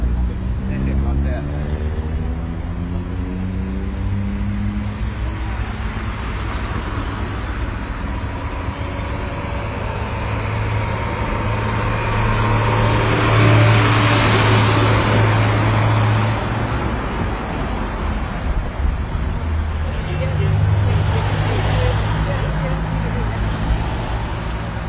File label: car